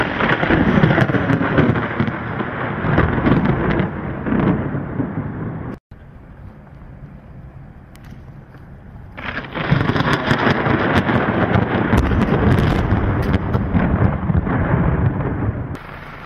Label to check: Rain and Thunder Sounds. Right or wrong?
Right